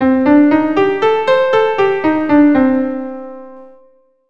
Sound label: C Semitonal Pentatonic scale